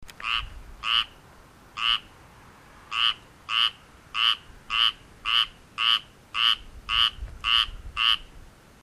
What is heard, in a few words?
Hyla squirella